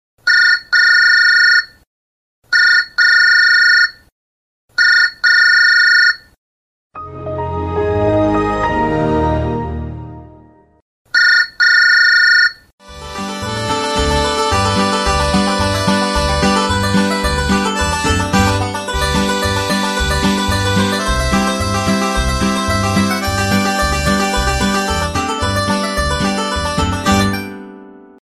samsung galaxy ringtone

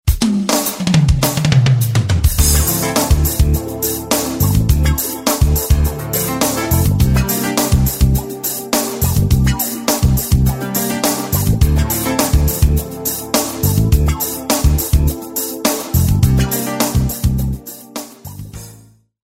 funk fusion electronica music tone ringtone

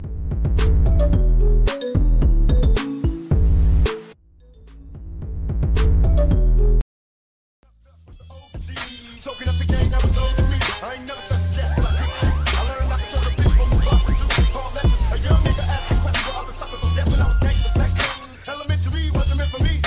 nokia ringtone

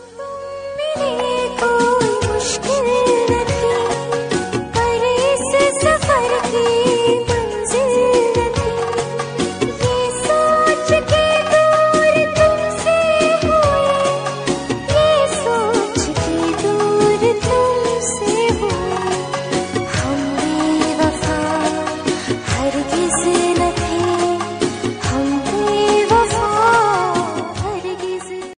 very sad ringtone, bollywood ringtones

